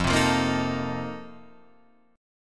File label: F 11th F, down, Guitar Drop D